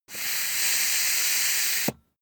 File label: air pump ball hard